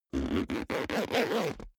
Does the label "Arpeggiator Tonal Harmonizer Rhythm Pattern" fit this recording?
No